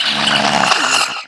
stalker communicate; monster within; soundmorph

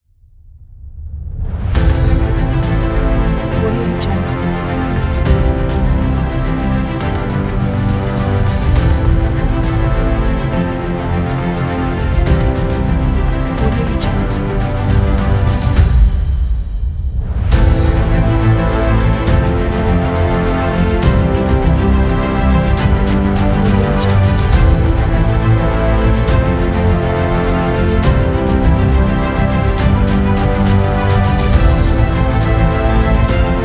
dramatic epic action pack

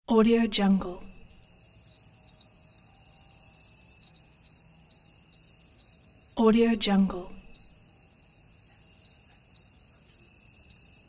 cricket

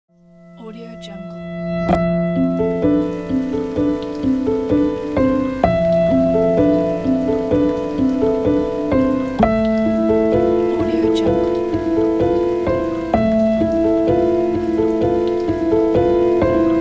the rain piano

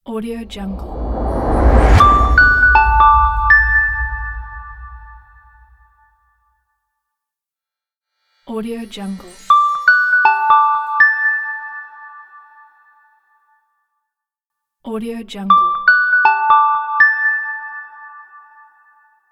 corporate cinematic logo

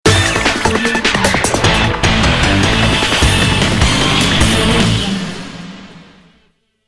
energy pop rock logo

